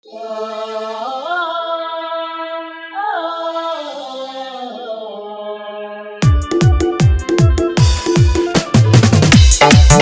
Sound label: Full Desh Bhakti Dhollki Bass Mix